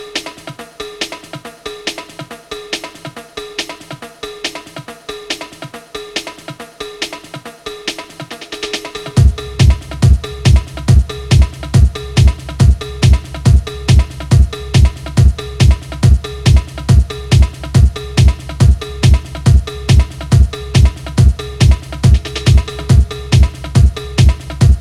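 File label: steel drum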